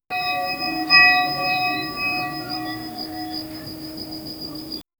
peaceful indian morning, spiritual

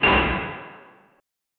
distant metallic clank of, factory

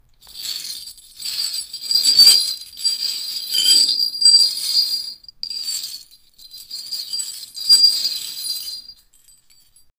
creaking ropes splinterin

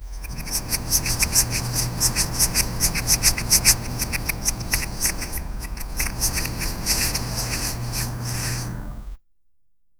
soft finger fluttering wi; sound effect

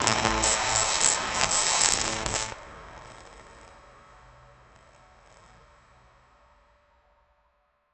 sparkling stump of a cyber

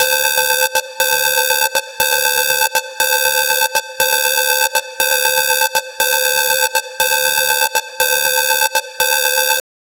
loopable low hp warning; cyberpunk

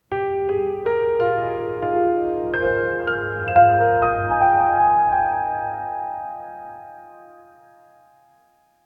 soft ambient music with e

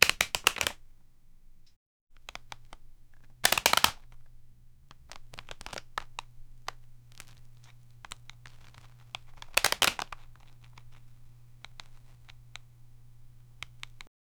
video game collectible; collectibles

